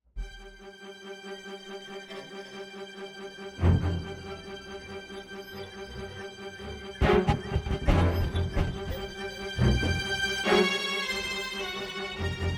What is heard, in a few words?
trance formation